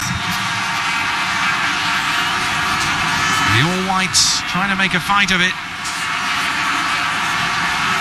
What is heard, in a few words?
vuvuzela